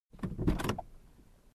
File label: Car door open from inside